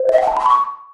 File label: data loading